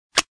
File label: Button